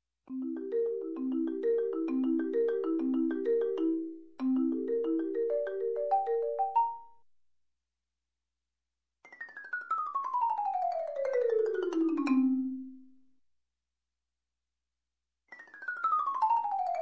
Marimba arpeggio, Music, Percussion; Sound Ideas 2000 Series

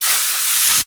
Aerosol Can Long Spray, Bathroom; 1001 Sound Effects

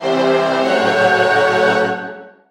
Music Sting; Menu FX

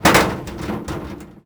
Metal Rattle Laundry Chute; Foley - Rattles

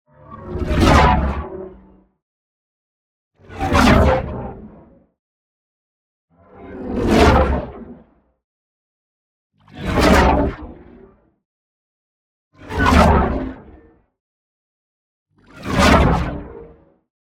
WHOOSH LARGE Metallic Force; boom library; Matter

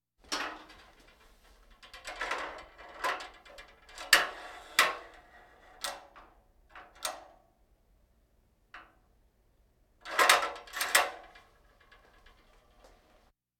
Hospital effects

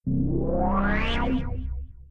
scan On; Apotheorasis

